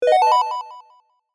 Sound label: classic game action positive